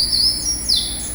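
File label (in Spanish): aranero coronado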